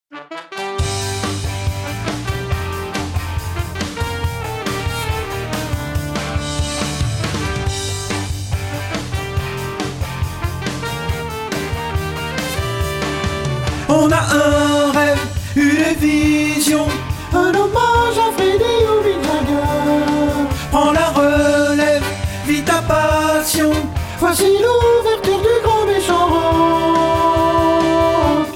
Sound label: alto